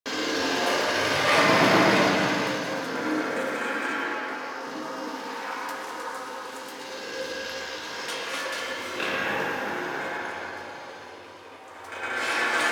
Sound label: Interior Small Lab Bed Loop; Warframe; Ambience; Entrati